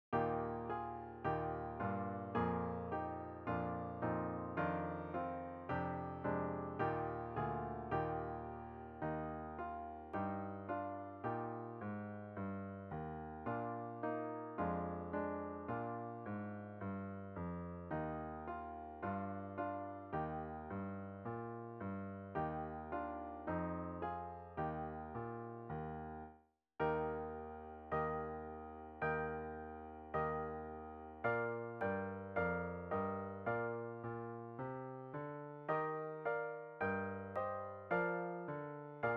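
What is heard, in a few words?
Solo Two Eb Brass and Clarinet; first con 2024 stage 1 2 3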